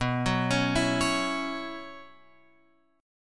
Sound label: B Suspended 2nd Suspended 4th down Guitar Open F; arpeggiated; JGuitar